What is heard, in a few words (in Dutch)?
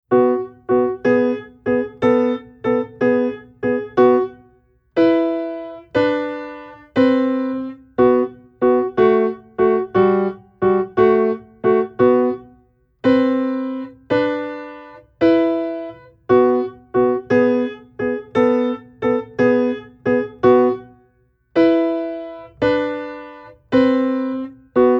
Oefeningen met kwinten, sexten, Piano oefeningen